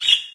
jungle open program; windows 9x; sound schemes

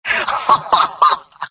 laugh happy; scout; video games; source engine; tf; mvm

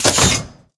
sphere attach

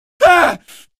pain, male; episodic; episode 1; Source engine

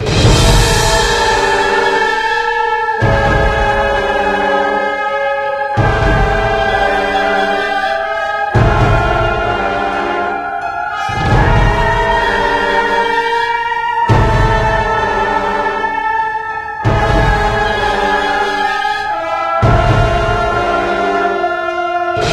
asphyxiation, music; left4dead; pzattack; Source engine

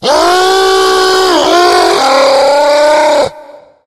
hulk yell, tank voice yell; Left 4 Dead; Source Engine

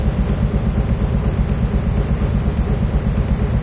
industrial loop, ambient tones; Source Engine; cstrike

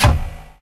popup; Left 4 Dead 2; Source Engine

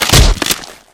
body medium break, physics

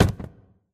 phys cube, physics

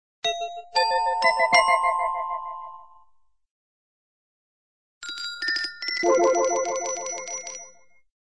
sci fi doorbell; cartoon; jetsons

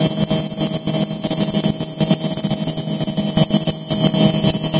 lights flicker; ambient; spacial loops; Left 4 Dead 2; Source Engine

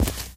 walk tile, footsteps

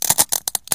glass strain; physics; Source Engine; cstrike

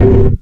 glass pane knock

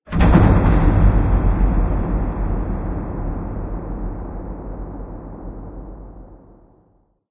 amb muffled lo mach

